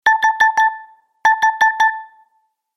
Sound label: timer ringtone, apple sms